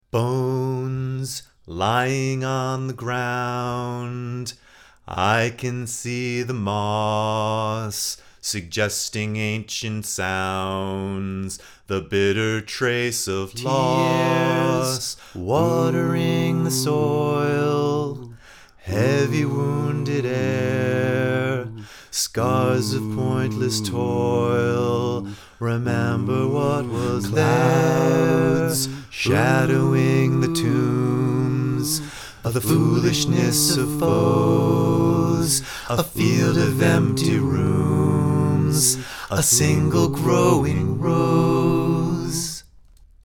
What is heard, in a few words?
dramatic regretful war song